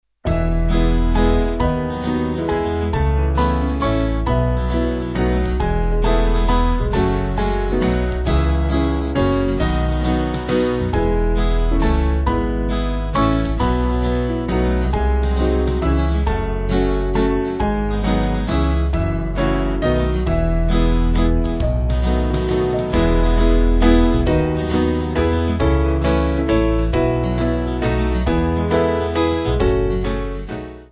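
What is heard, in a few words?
Band, Music Backing Files for Churches; Small Band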